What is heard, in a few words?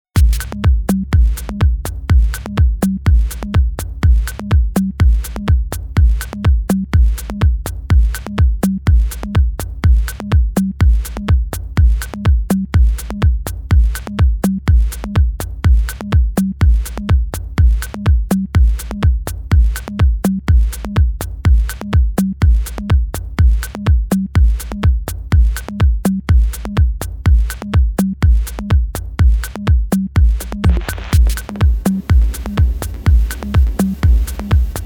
Techno, Remix